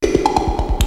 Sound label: RAYGUN; BEAT2000